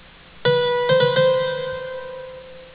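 Accent und Mordant (appogiatura with mordent; Palmer: "appogiatura and mordent")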